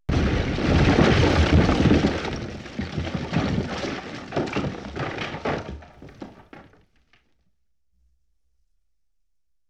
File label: Explosion, 5.1 Surround